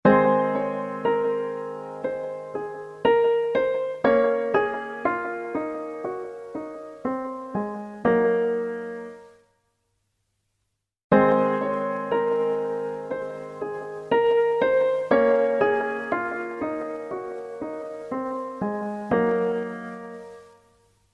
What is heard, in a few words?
piano; Waves